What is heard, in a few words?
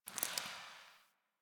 Derelict Interior Water Drip; Warframe; Orokin Derelict